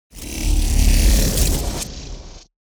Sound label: transitions sci fi movement; SoundBits